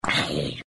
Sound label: Zombie